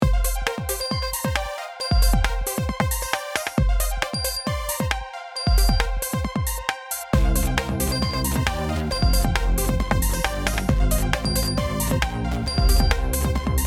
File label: TECHNO MOTO